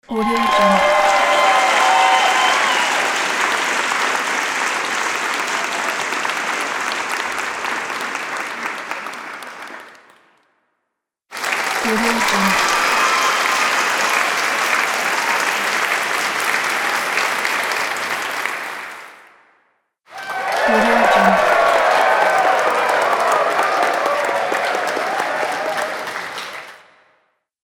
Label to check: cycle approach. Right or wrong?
Wrong